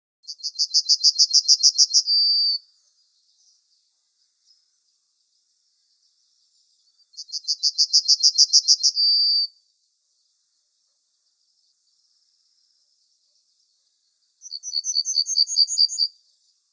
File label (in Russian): obyknovennaya ovsyanka golos